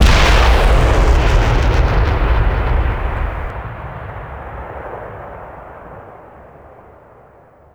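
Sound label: axis bazooka soldier; WAR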